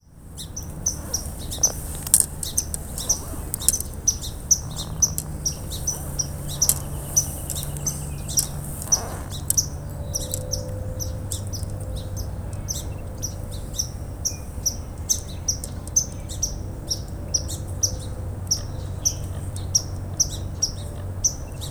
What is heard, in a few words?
swamp sparrow song sparrow; Swamp and Song Sparrow